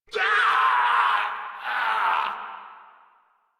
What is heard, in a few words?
Death, Grineer Male; Warframe